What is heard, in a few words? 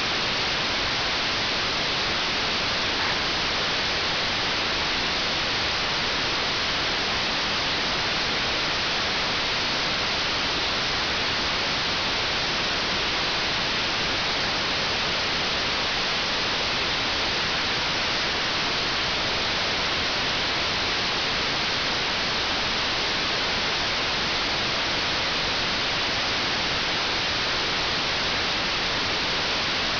FSK441 140ms, 432mhz